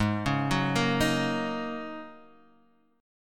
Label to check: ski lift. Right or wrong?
Wrong